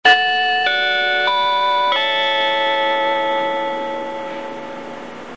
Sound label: train chime; スーパーひたち; ６５１系のチャイムです。